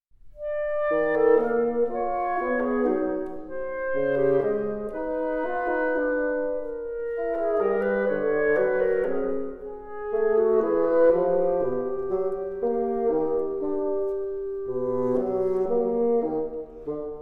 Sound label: Valse; Hetu; Musique pour vents; Quatre miniatures II